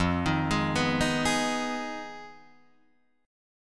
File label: F Minor 7th Flat 5th F down Guitar Drop D